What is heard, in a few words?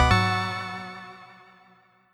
Key Pickup Gb